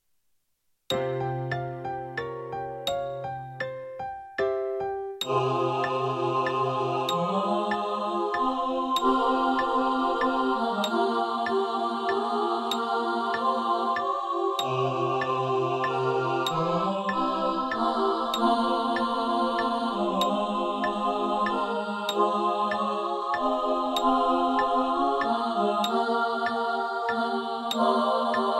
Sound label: blow blow satb, choir